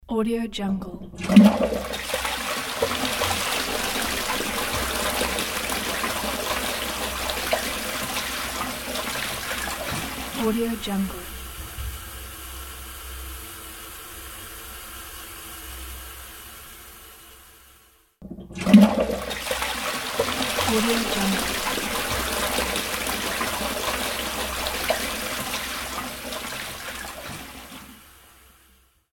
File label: toilet flush